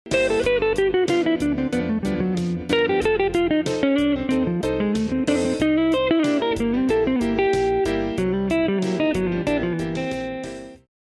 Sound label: assembly lick